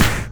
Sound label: snare fx; Breaks N Beats Sound Kit; Beatz Kit